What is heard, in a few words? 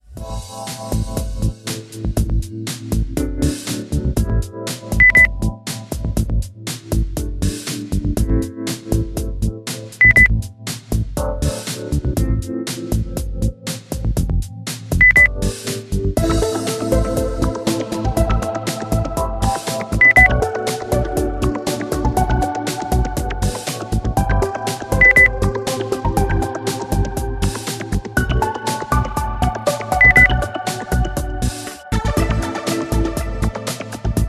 lounge beep